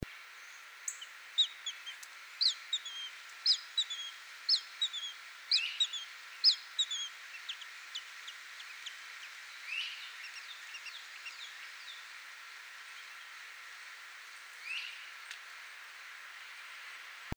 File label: Mississippi Kite Ictinia mississippiensis